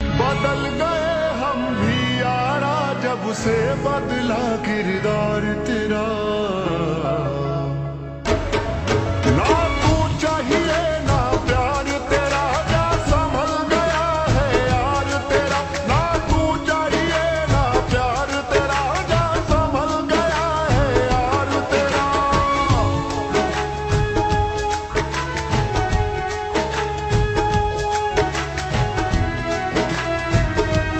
Slowed Reverb

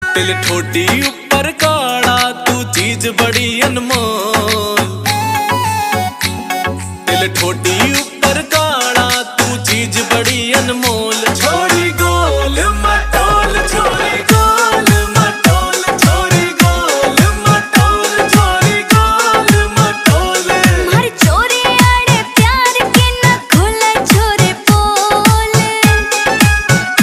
Ringtone

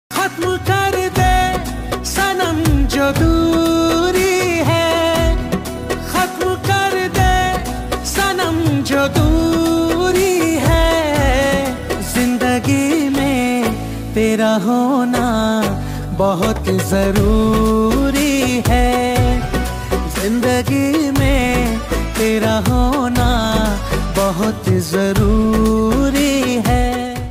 Ringtone

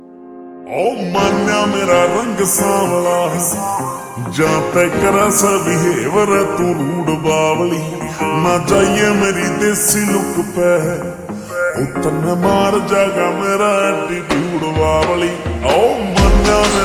Ringtone Slowed Reverb

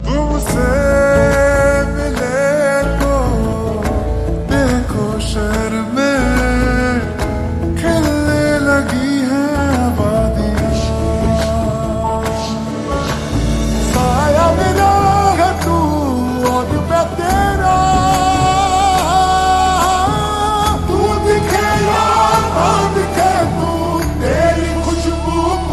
Slowed Reverb

